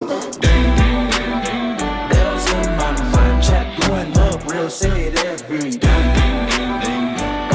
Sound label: bells